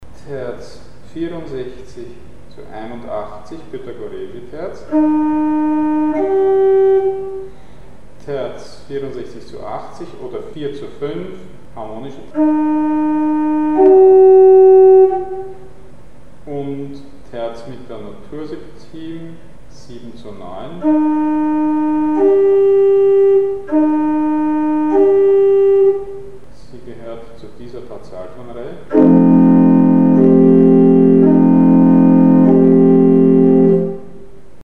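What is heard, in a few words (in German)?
Großterz 7 zu 9 Frequenzverhältnis; Mikrobuch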